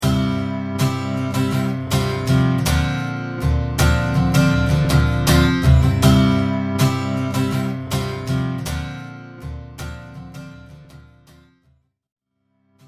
volume guitar

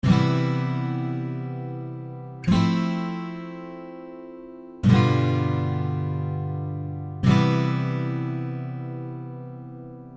Gt CFGC 7th

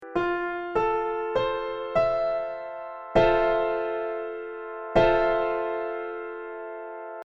Gt FM7 piano

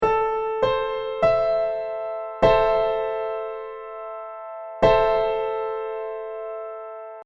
minichord Am

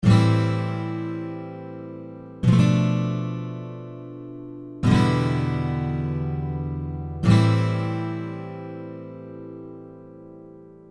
Gt A B E A 7th

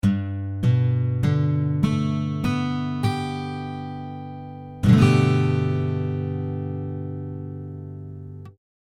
Gt Gadd9

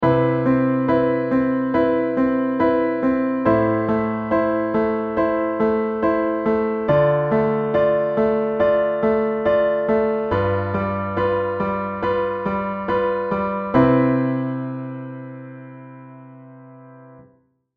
Gt C major

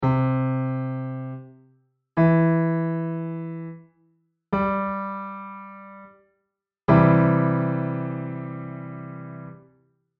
Gt C chord

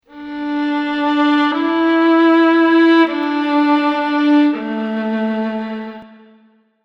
reverb

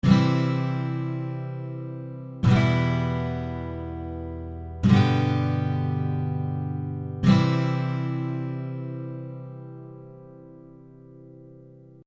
Gt C E G C

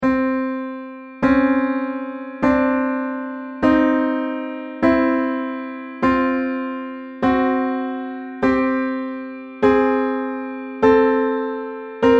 Gt ontei

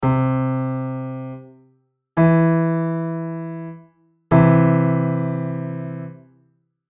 Gt do to mi

